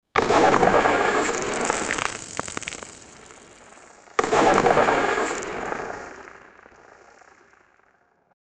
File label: a sound for an electronic, rock